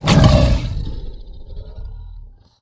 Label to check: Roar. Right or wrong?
Right